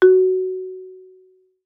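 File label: Marimba rubber fortissimo Gb4; MIS Pitches - 2014; Percussion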